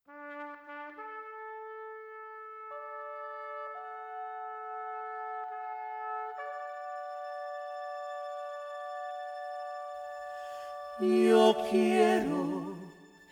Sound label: Mariachi